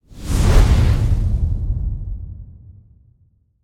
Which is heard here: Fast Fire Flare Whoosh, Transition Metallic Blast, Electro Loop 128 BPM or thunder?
Fast Fire Flare Whoosh